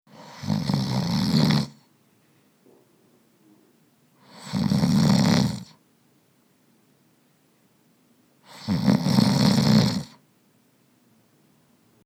RONFLEMENTS

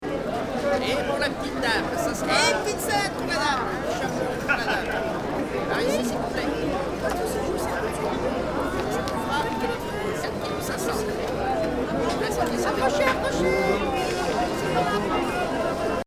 MARCHE EXTERIEUR

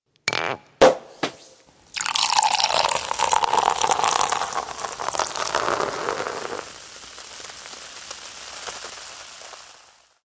BOUCHON DE CHAMPAGNE

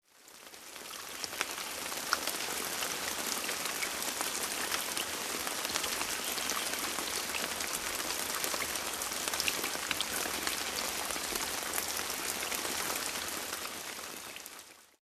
LA PLUIE

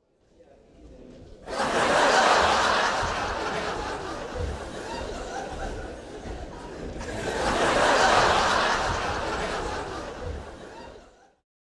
RIRES

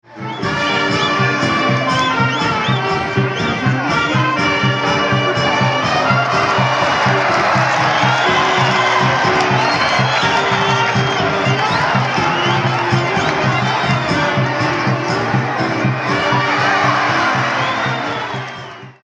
OLE CORRIDA, ambiances diverses

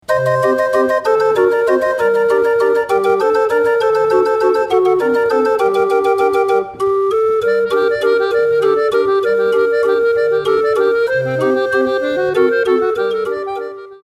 orgue de barbarie

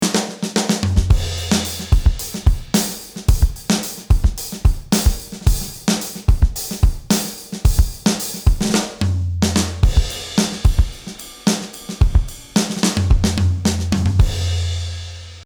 La batterie

